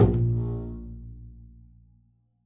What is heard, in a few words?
pizz bb1, double bass